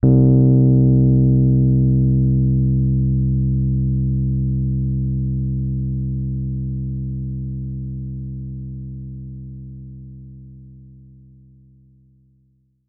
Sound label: Rhodes forte c2; Best Service ProSamples vol.42 - Session Instruments